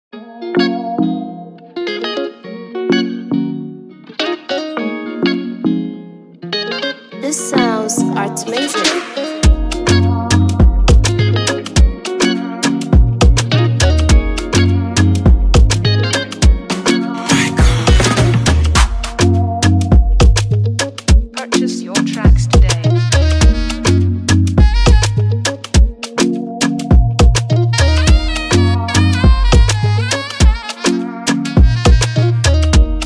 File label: Afro Beat Instrumental